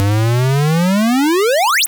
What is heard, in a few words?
Game Boy FX, chiptune; Nintendo Game Boy